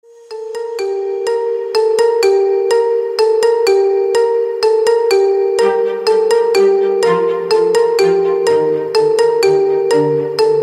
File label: Carol of the Bells Marimba; Christmas 2022